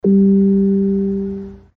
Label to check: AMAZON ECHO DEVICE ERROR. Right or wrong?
Right